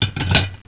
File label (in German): Teller